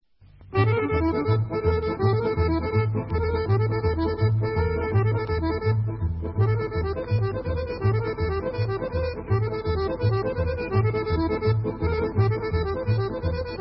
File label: Bulgarian Sopluk